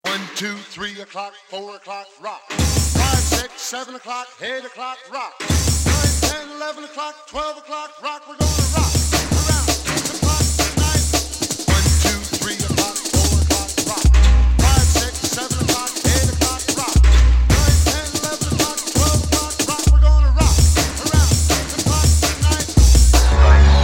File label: remix